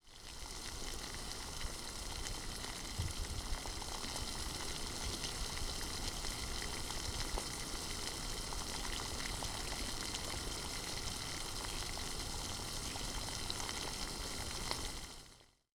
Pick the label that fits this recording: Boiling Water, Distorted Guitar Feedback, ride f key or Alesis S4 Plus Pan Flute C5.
Boiling Water